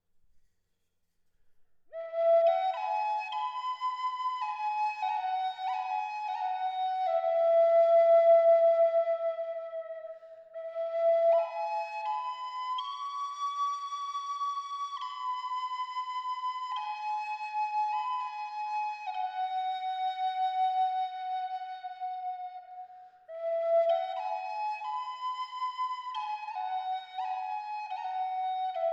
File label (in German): Ast Holunder D hoch 432Hz